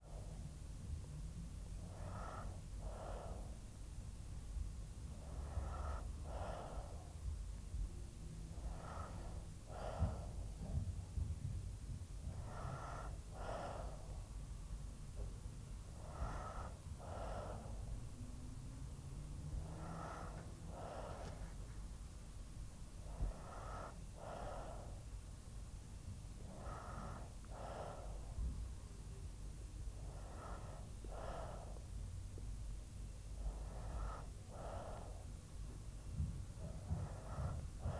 Sleeping Baby 3yo

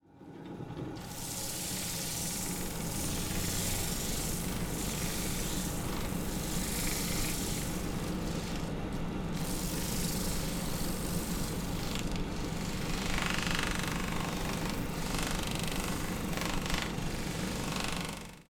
Shoe Polish Machine

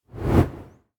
Whoosh Short

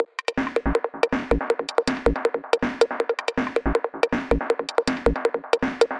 160bpm Groove

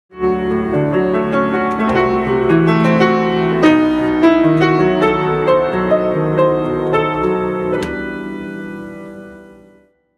Piano Improv Romantic

